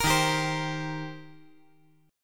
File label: Eb 6th Eb down